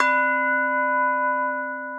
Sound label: Crystal Big Bowl, majestic mallets; Multisampled; Ableton; Mood Reel